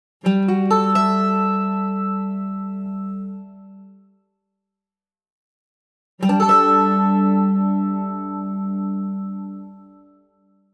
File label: Jingle B Mandolin version; Secrets of the Silent Witch Original Soundtrack (2025)